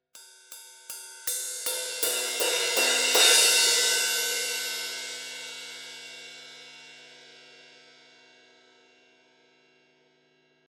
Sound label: 20 I Ride crescendo hits, cymbal